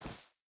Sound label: footstep burlap grass run; Rust